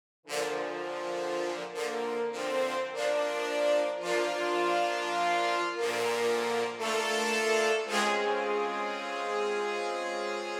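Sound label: trombone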